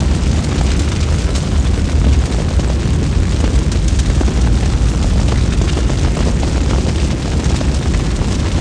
burning

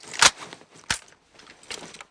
m95 clip tap, weapons

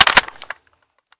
g36 mag in; czero opforce

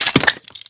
frag pin, weapons; csof

